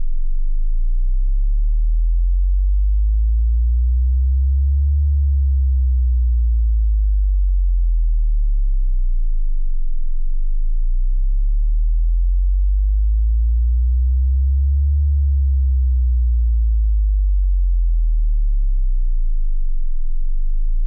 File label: 20hz to 80hz sweep loop